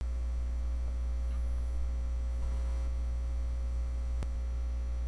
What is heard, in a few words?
door